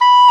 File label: WND D OBOE, Oboe D'amore; Roland L-CDX-03 Disk 1; Oboe 4-8